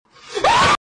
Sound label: terrified scream